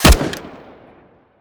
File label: aug, weapons; Counter Strike Model Pack; CS2